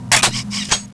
change empty; vulcanus3

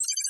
ship blip

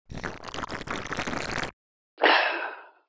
slurp

